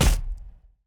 monk staff ground miss

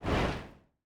book staff cast

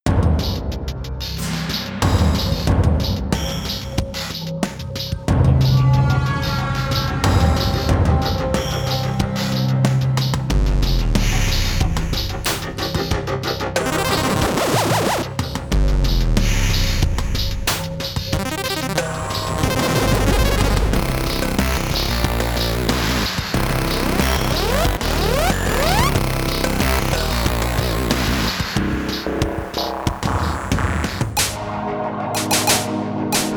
Music Boss

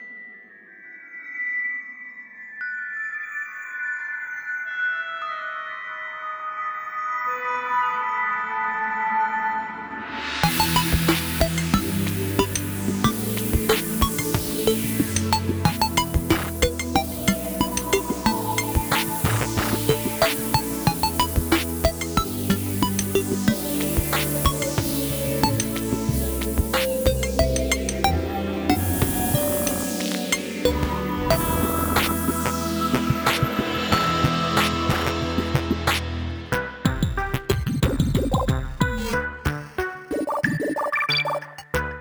Music Ocean Day

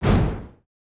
fireball shot; betsy

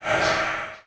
Zombie

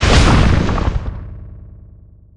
rubble attack; Deerclops

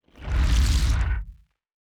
skeleton summoned

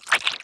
NPC Hit

